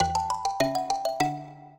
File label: minuet, mbira; Mozart